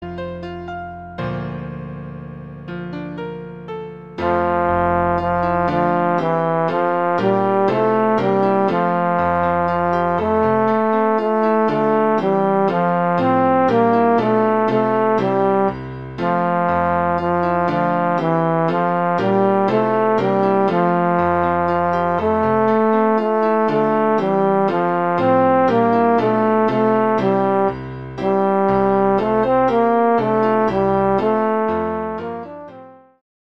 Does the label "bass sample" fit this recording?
Yes